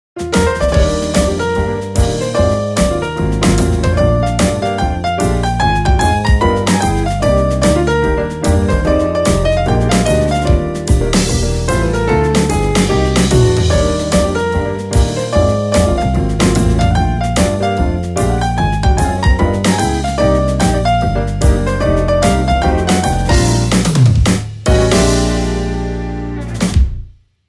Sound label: Quartet; Sony Ericsson; PlusFourSix